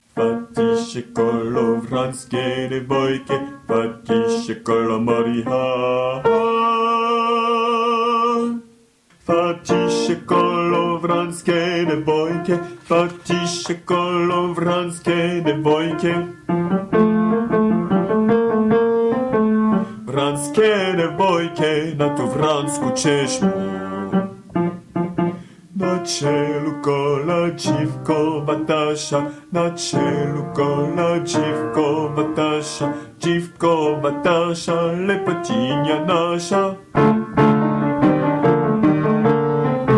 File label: Fatise kolo